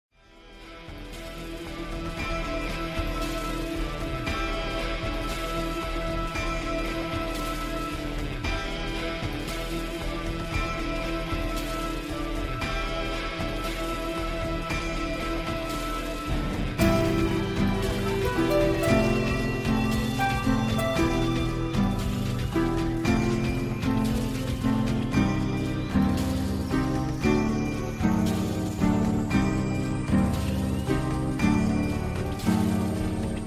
candescent Cavern; dragon Saga

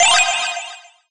open ui

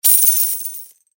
coin

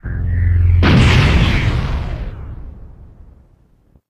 big boom

